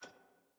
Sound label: explosion hover; jinx